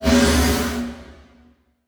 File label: Multimedia Internet CD-Rom Flash Whoosh